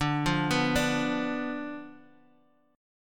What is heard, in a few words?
D Minor 6th; Dm6 arpeggiated; 10,8,9,7,x,x